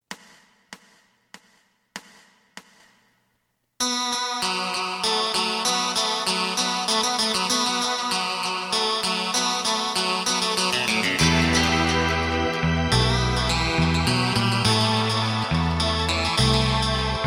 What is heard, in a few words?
drum